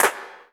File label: CYCLAP; Best Service Dream Experience